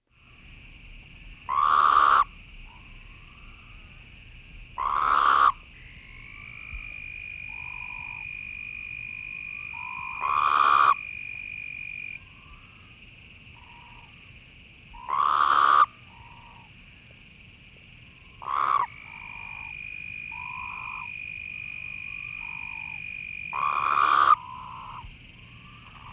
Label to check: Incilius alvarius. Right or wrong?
Right